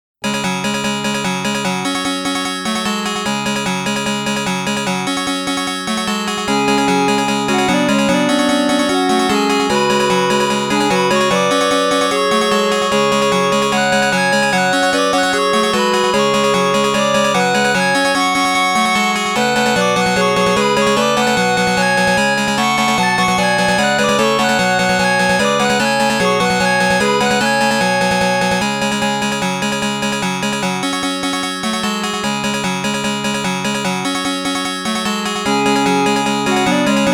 Stage 3 Boss BGM; Gun Smoke

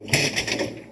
pinball push

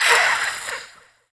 ghost witch voice hiss

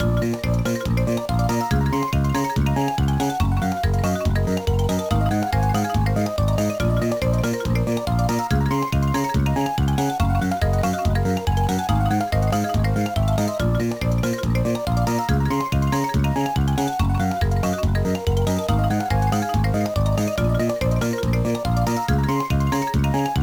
BGM; Jurassic Park Institute Tour: Dinosaur Rescue; GBA

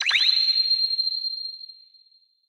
Join Ping; Boomerang Fu

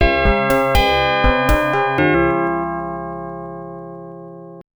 Game Over; fire shark arcade; Gleam

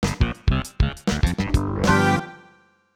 Sky Diving Landing Accomplished

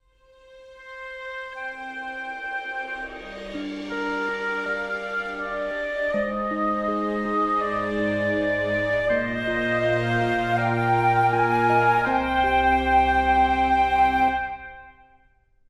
sting sad things; The Sims 3; Windows

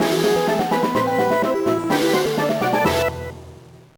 Unused Jingle; Legend of Spyro The Eternal Night 2007 gba

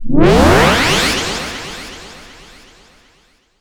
Stage update; Pac-Man Championship Edition 2 Plus

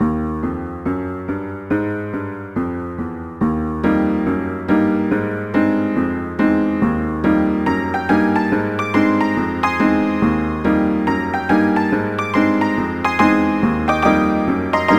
Dance Jive Medium; knigge spielend zum guten benehmen; nds

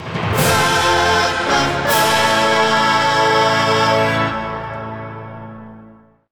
Goal Fanfare; crash bandicoot series sound box; Evilocity; 3rd Place

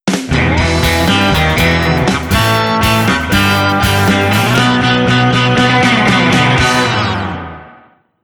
Sting Win

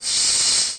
Balloon Spawn; bloo kid 2

